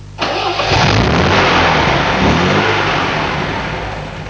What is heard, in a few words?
MGB 1800+ 2 SU HS4